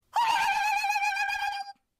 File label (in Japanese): 電話の着信音